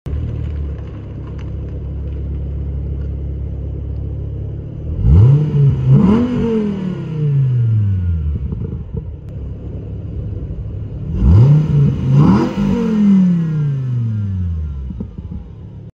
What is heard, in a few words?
Dodge Viper